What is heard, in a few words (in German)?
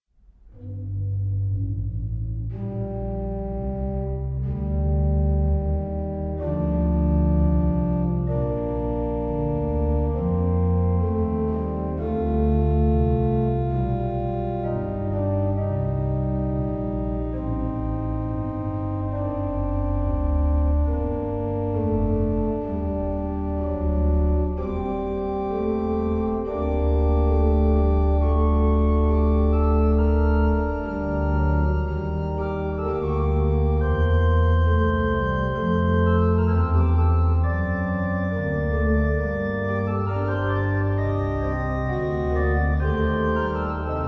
Chororgel, Nun komm der Heiden Heiland